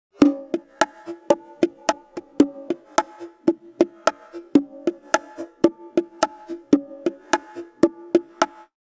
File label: Bongo